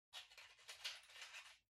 Match Far Rummage

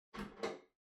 Pendant Light Far Pull

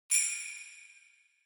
Sleigh Bells Reverb Knock Hard

